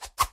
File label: Double Swish